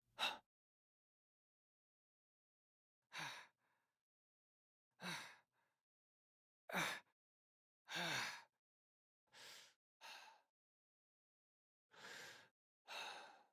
Vocalizations; Garren Heal Part Two; Envoy; Garren Boss Arena

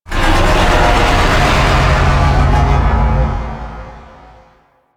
Void Dragon Vocal Death; Enemies; Vocals; Warframe; Duviri

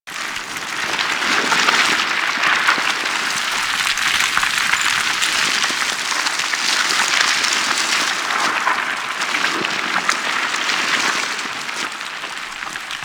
Rock Evaporate Small Loop; Props; Warframe; Ambience; Sentient Devourer

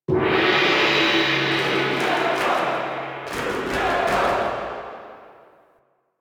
Ball Gong Hit; Warframe; Missions; Tenno Ball

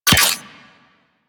Guandao Pistol Projectile Attach; Warframe; Tenno

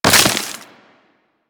Melee Impact Wood; Warframe; Weapons

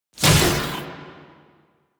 Shot; Warframe; Quests; The New War; Weapons; Omicrus

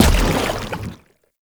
Step Default, Foley Footsteps; Enemies; Warframe; Lasrian Soldiers; Lasrian Chem Strike Nox

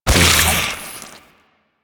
Secondary Barrel Loop End, Weapons; Warframe; SU Modular Guns

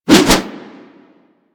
Axe Spin Swing; Warframe; Weapons

